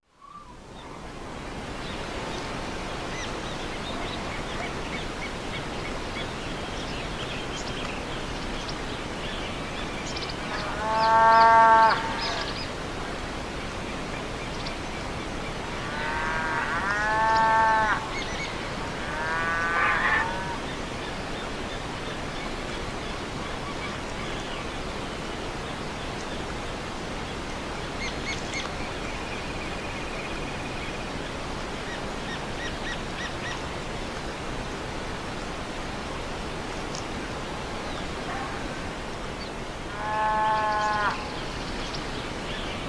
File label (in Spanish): campo familia; bigand